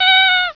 DOLL CRY

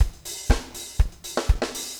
4 on floor 120, loops, 120bpm

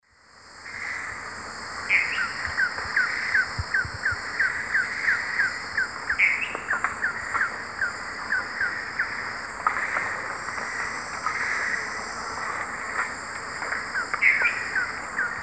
Bucco tamatia, calls; S. America; Eastern Lowlands

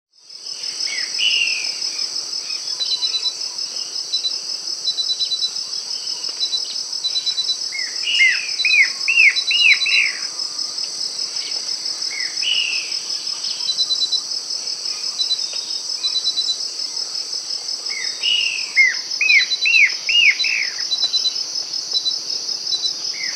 Blackish Antbird (Cercomacra nigrescens), Peru; S. America

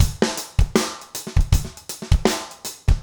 trip hop

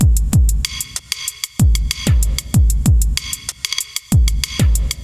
kit; Sound Effect Library

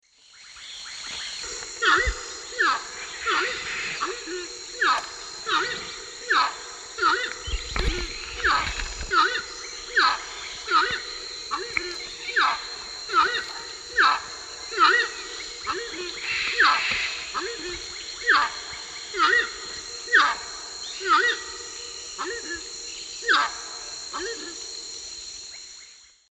Anhima cornuta, song; S. America; Eastern Lowlands

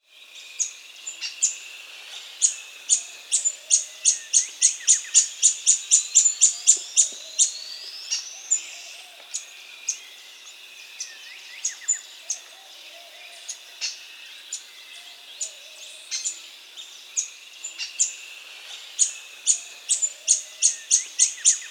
Montane Foliage-Gleaner (Anabacerthi; S. America

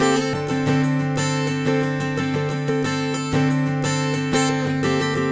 Acoustic Strum, Guitars

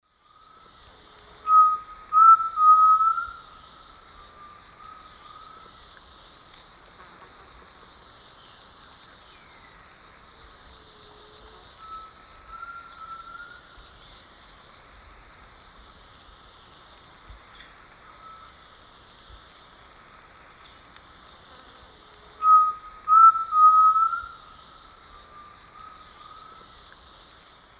Crypturellus undulatus, song; S. America; Eastern Lowlands

